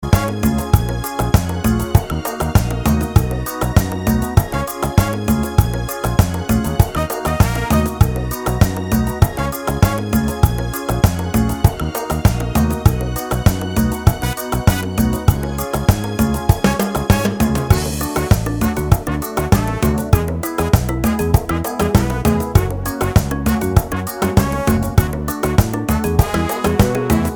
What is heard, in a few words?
cumbia